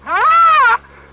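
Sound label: rhino white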